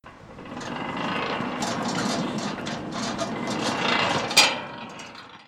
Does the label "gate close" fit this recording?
Yes